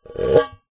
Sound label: tin can scrape ribbed edge; foley